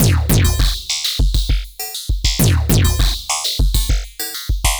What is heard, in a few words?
Ratchet Beat; Sonic Foundry (Sony Creative Software); Ma Ja Le Ethereal Textures; Beats